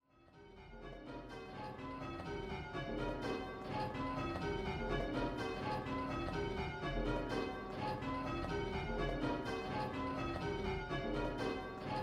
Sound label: call change, 8-Bell; Parts 2, 3, and 4 are more difficult as most changes will not be recognisable and will need to be carefully listened to and analysed